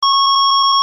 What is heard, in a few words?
Beep; Museum Alarm Options